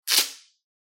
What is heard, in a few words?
tape pull; soundscrate